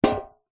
foley tin metal cylinder lid on set down on carpet; zapsplat